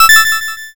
notify

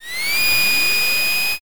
kettle whistle

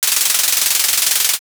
shuffle

